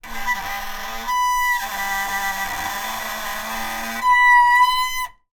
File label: transport bicycle brake squeak slow pressure loud; zapsplat